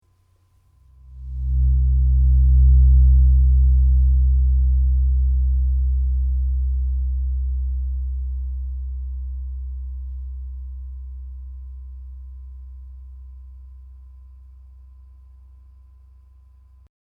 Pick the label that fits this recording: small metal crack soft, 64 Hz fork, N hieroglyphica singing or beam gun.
64 Hz fork